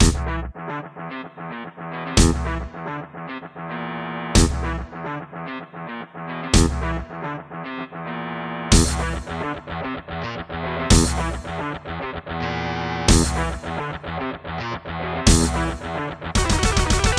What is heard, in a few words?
Casio LD-80; Doom - E1M1